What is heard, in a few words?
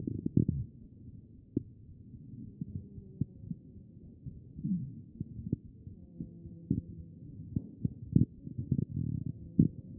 chest; B MODE